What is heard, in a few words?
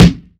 clean steel snare drum sample a sharp key; Duration 0:01